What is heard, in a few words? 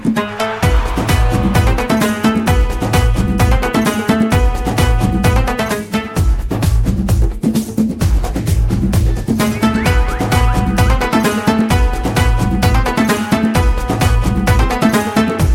Instrumental BGM